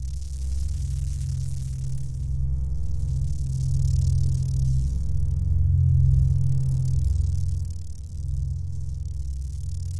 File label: laser loop; Ben 10 - Omnicode